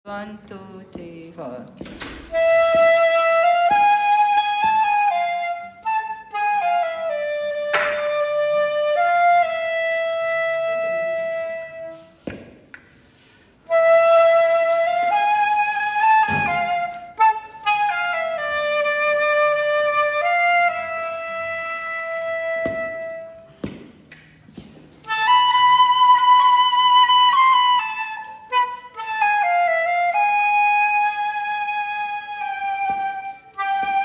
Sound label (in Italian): flauto